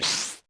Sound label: Gui open tab; littlebigplanet 2 ps3 gamerip 2011